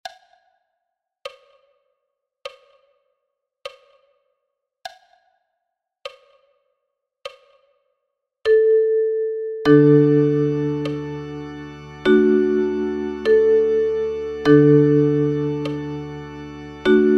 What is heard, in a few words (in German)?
Uke und Bariton Sound; ukulele duets; weihnachtslieder